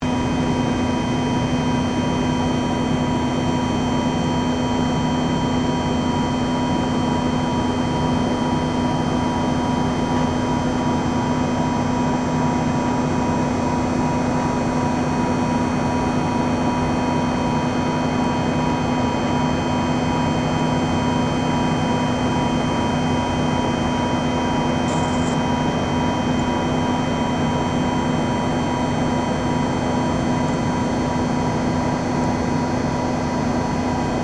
SERVER ARRAY LARGE OPERATING, Computer server banks; Electronics